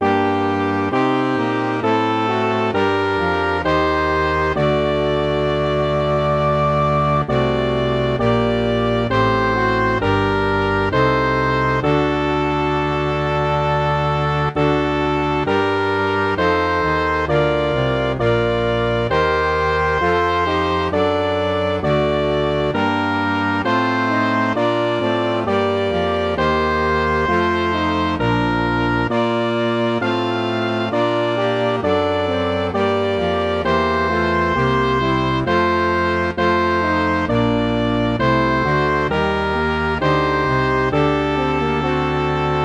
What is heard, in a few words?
MIDI